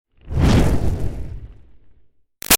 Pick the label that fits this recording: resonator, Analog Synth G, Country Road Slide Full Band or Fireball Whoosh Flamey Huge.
Fireball Whoosh Flamey Huge